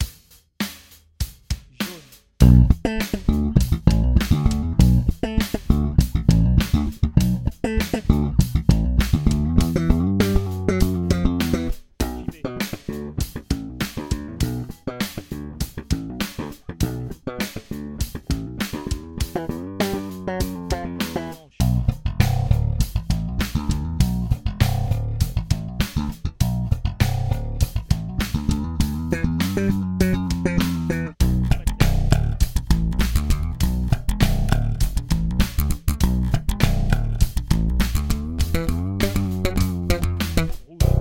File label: slap